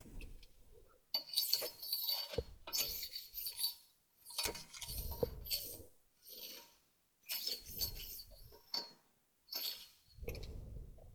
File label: Rubbing Metal